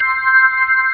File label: Hi Slow D#3, B3; SoundFont - The Developer's Choice - Volume 1